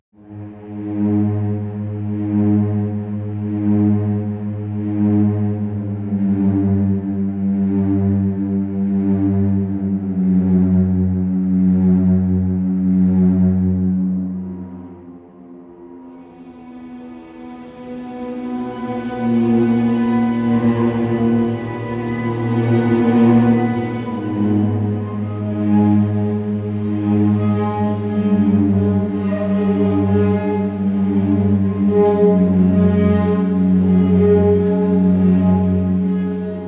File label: Sad Alone Orchestra Strings Drama Music Mood Surround